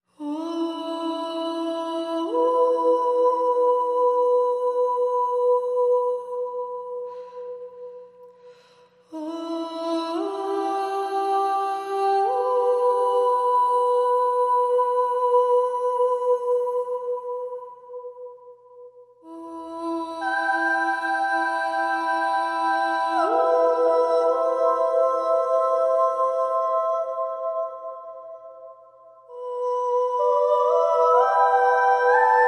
Vocal Only

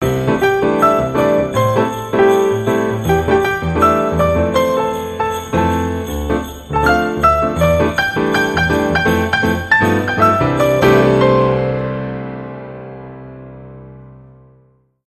Jingle Bells

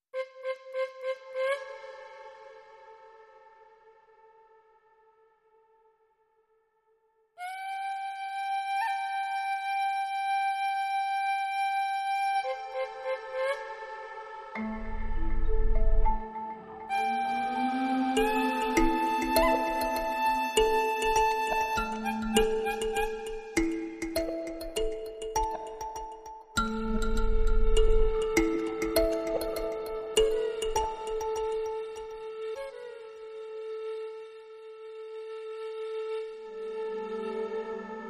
No Percussion

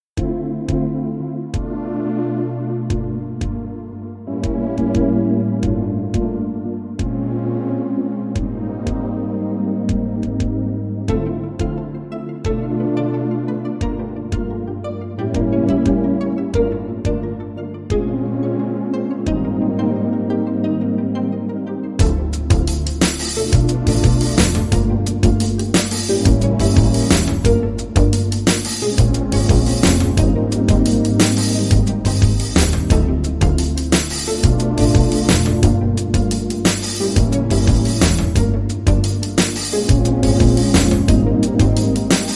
No Melody

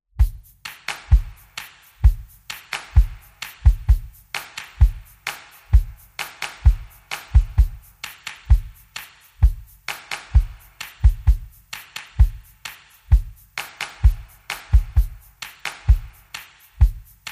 Drums and Bass Mix

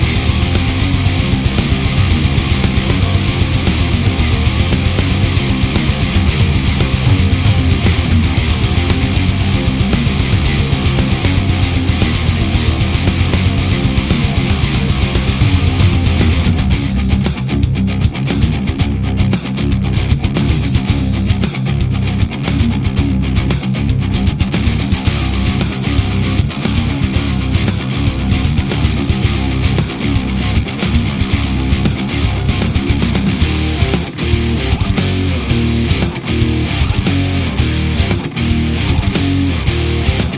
Underscore

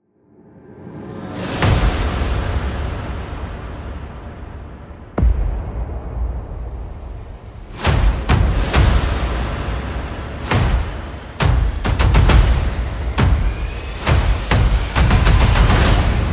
Percussion and Effects

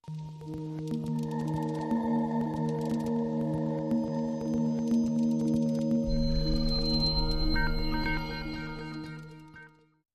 Dark Sting

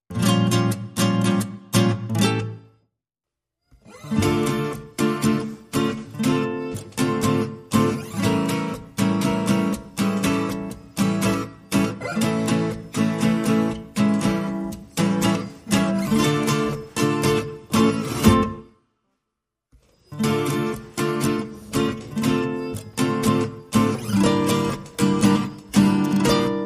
Guitars Only

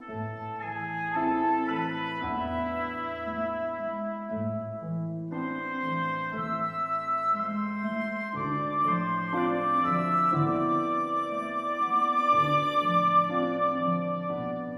Happy

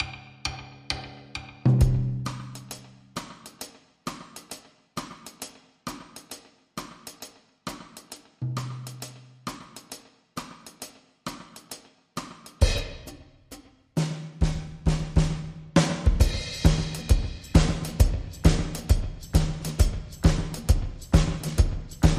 Drums

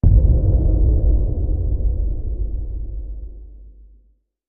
hit

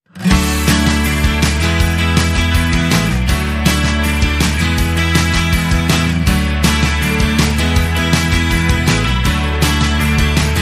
Jingles

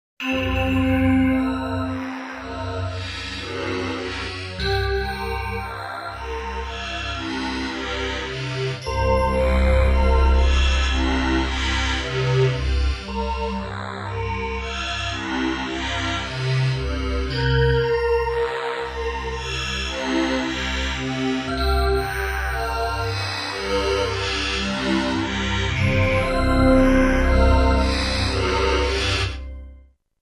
Slow Childlike But Evil

